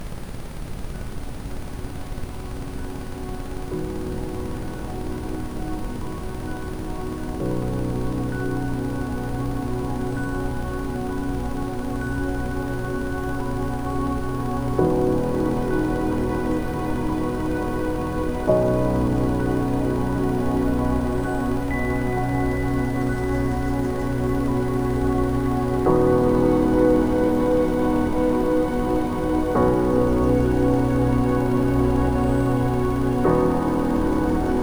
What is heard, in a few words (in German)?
Rife Energie Elevation mit Ambient Sound; rife frequenzen